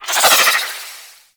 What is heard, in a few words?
Arch Wing Prime Dodge Roll Left; Movement; Warframe; Vehicles